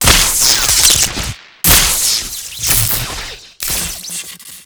explosion television sparks; modern warfare 2

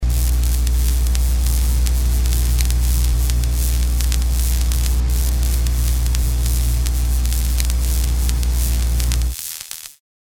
electric; modern warfare 2

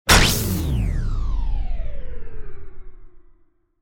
zap; modern warfare 2

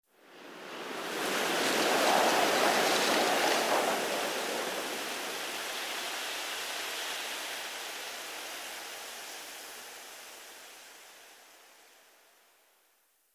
wind leafy whistle; modern warfare 2; amb elements

